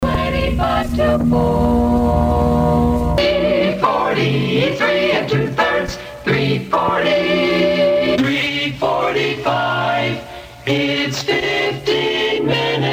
jingles time chex montage; futursonic

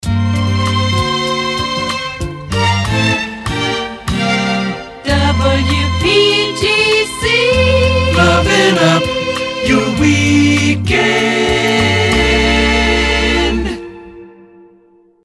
jingles resings; pams; lovin up your weekend

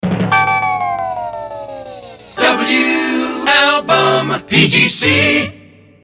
jingles resings; pams

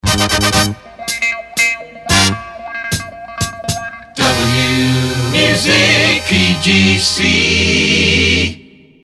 jingles resings; pams

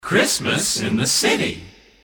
group shout christmas in the city, jingles; 1977 christmas kit

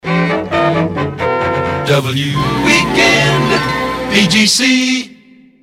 jingles resings weekend; pams

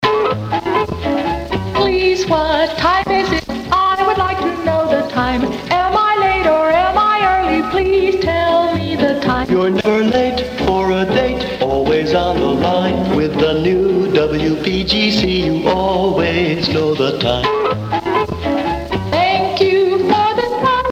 jingles; please what time is it

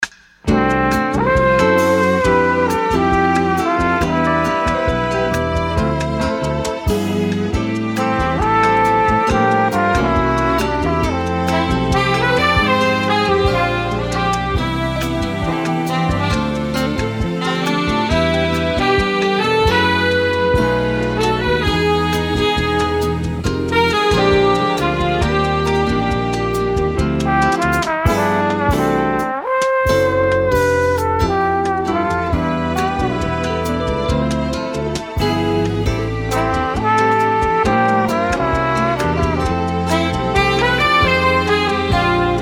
christmas kit instrumental beds

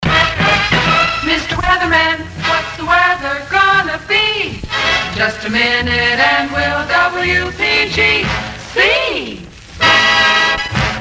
jingles highland mr weatherman restored; pams series 9

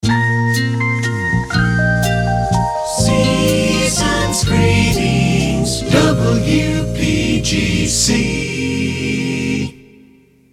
christmas kit silent night slow, Jingles

